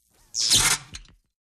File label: Short Electrical Shock